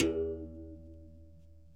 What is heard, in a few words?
Berimbau, World Percussion; Sony CS